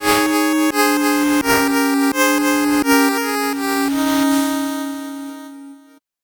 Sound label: 8bit jingle fast